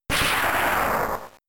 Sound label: Thunder; Pokemon; Gen 3; attack moves; RSE